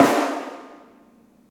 snare, Real

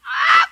"ah", squawk; Voice; ooh ahh

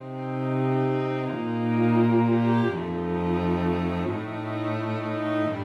strings paranoid, Sad Loops

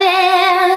"innnn", Voice

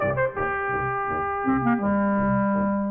horns, Loops

